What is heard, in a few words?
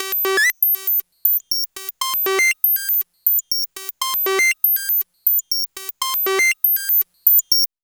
SERGE; AMG - Vince Clarke Lucky Bastard